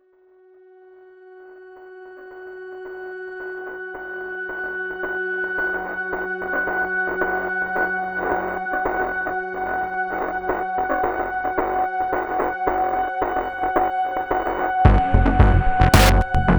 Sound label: re Engage Instrumental